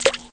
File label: skip; hedgewars